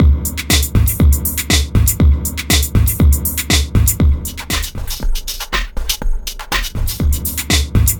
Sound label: Beat